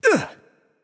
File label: grunt attack; smite; tsukuyomi